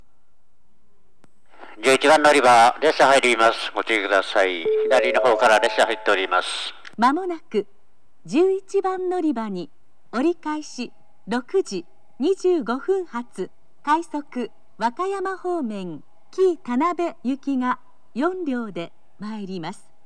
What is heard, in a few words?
shin osaka rapid kii tanabe